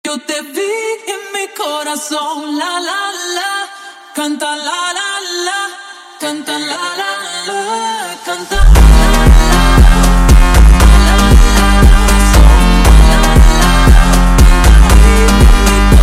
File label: super Slowed